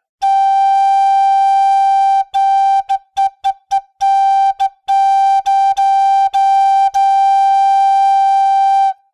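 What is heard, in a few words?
G American Flute low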